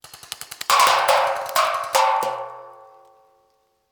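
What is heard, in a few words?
Paintball Gun Impact; Sound Ideas; Guns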